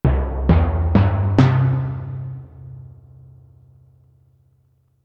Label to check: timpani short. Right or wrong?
Right